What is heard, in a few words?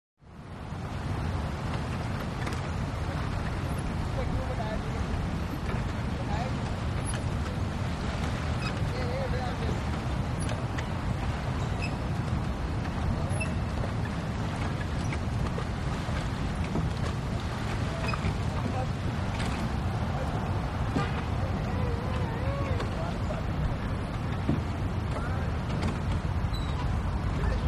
Lake Ambience A City, big city